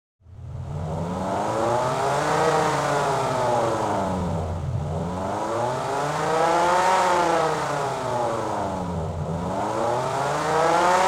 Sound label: Sports car engine exterior revving